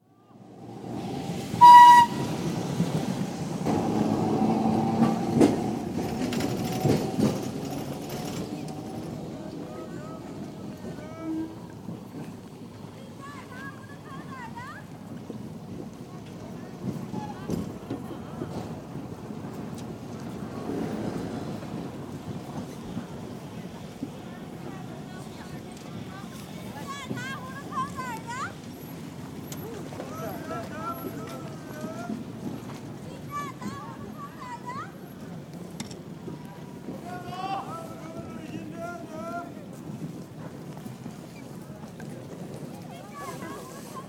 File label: Changchun: Street atmosphere, with trams & street criers; China; BBC